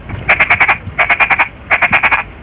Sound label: cerigensis, rana pelophylax; animals chordata salientia ranidae; eukaryotes